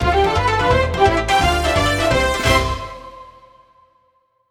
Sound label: Dance Short; Kirby